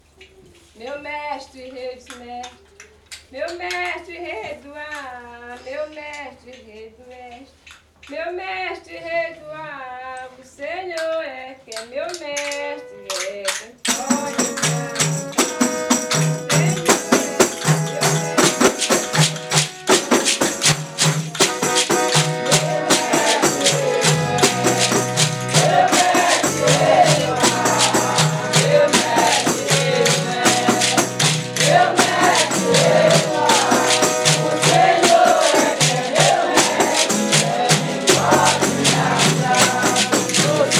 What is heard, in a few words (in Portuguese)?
fanti baiao de princesas meu mestre; Baião de Princesas é um ritual especialíssimo e único que acontece apenas uma vez ao ano